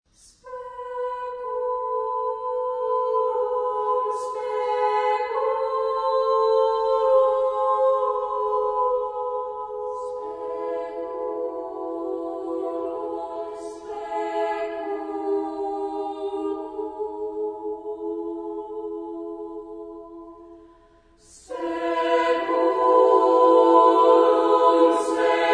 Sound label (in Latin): Speculum justitiae (female), chorus; Kostiainen